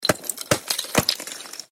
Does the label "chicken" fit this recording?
No